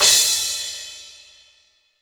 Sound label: Boss DR880 cymbal; drums